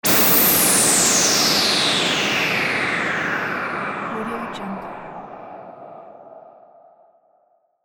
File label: ghostly white noise burst